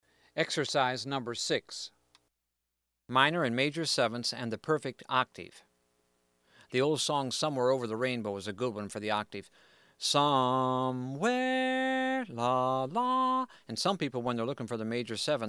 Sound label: Minor & Major 7th And The Octave